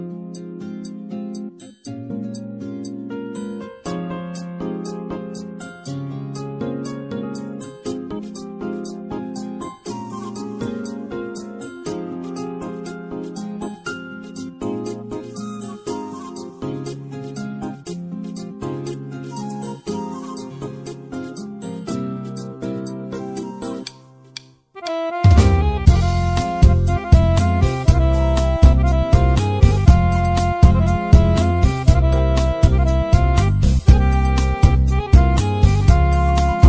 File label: Accordion